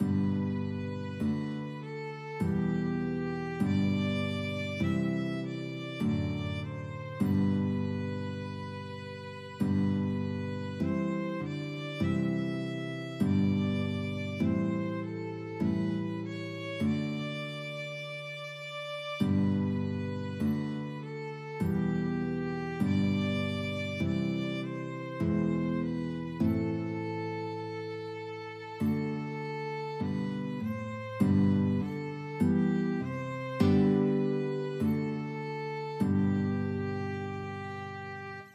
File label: abide with me violin guitar duet